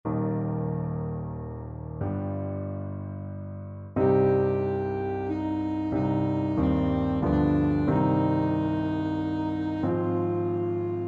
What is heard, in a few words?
ASAX, saxophone